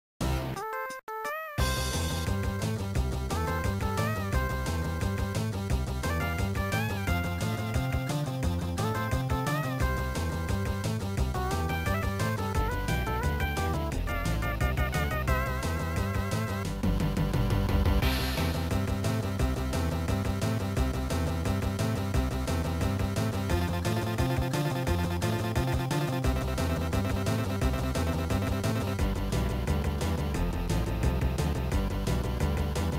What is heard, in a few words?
Rock and Roll (Mild)